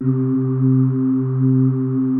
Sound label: BELL VOX C2, SYNTH VOICES; Music Store - Claudius Diemeter Schwerlos